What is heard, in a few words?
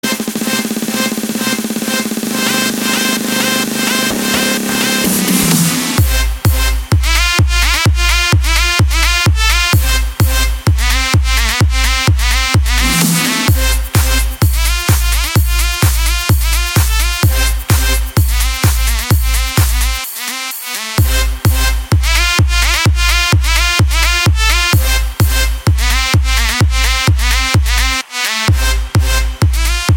Club Music; MIUI12